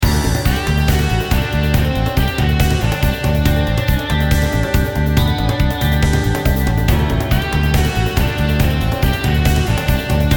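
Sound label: Exciting and Energetic Action Music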